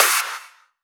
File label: Claps; Vengeance Samples